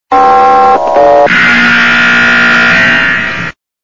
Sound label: siren buzz